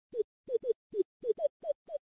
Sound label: quest complete; Monkey Madness II